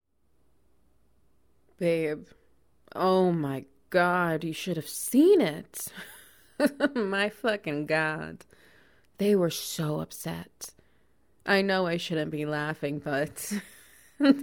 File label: Wet Noises; A Hero's Booty Call